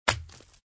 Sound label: bullet dead; croods